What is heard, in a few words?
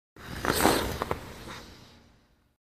Ice Skating Rough Tu